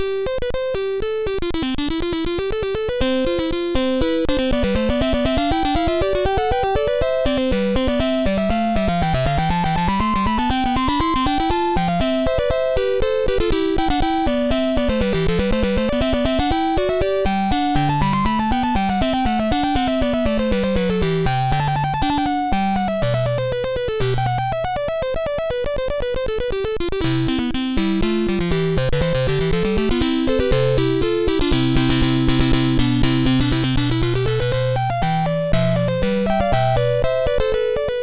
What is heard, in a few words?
prelude song; The Music Studio